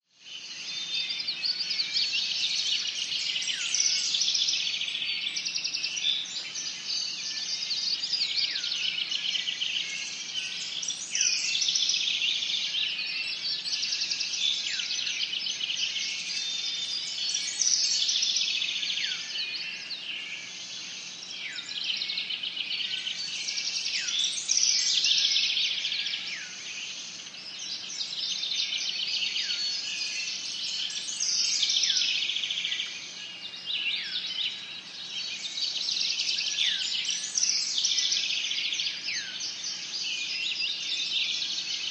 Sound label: ANIMALS-BIRD BGS RURAL NEW YORK: July dawn, very populous bird songs, low level wind background